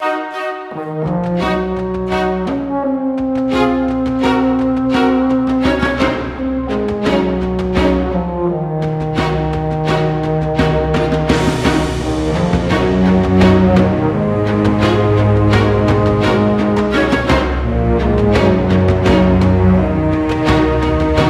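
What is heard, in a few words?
background music preparation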